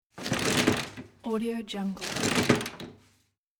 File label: paper towel dispenser